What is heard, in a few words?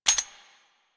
Gun Sweetener C, Metallic; Warframe; Gun Sweeteners; Weapons